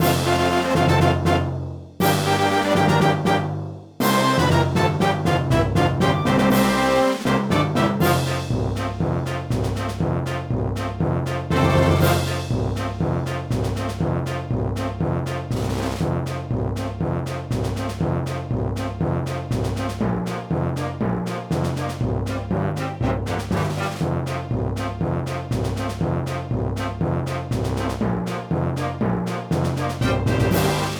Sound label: Classical Stage Karaoke; Pinky Street Kira Kira Music Hour Pop Princess; Classical Stage 1